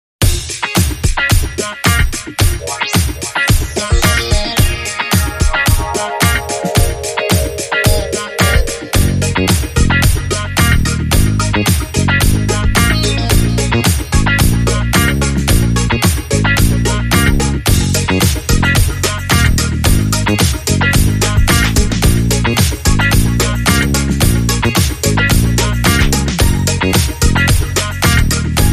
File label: Disco Strings, Short